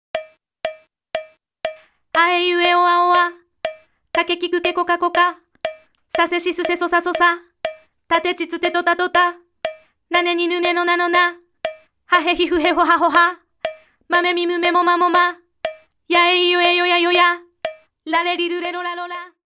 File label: articulation